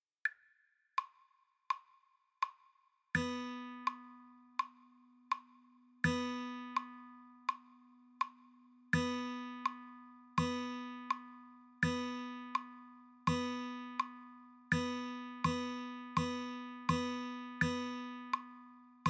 rhythm practice med, guitar

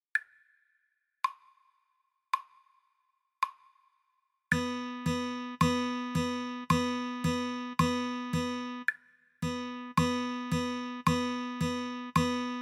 Rhythm Practice

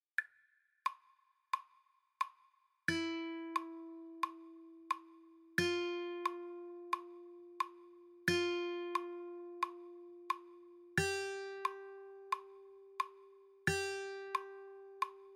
E F G Practice, guitar

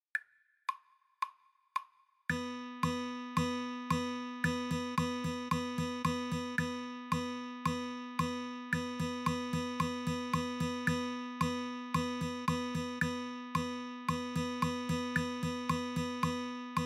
8th quarter mix fast

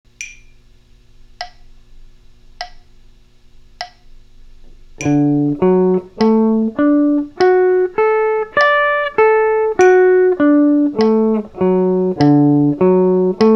caged e form arp

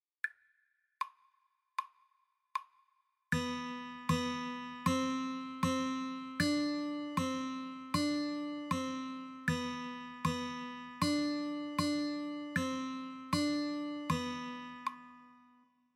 2nd string B C D, guitar

